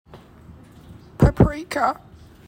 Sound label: fart